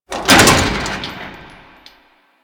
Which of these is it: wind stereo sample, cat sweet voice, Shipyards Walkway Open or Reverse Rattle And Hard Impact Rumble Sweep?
Shipyards Walkway Open